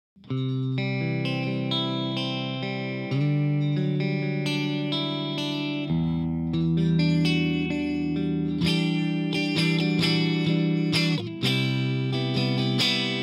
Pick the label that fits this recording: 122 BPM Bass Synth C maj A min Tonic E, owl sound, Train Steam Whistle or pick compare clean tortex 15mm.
pick compare clean tortex 15mm